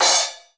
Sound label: crash, drums cymbal; instruments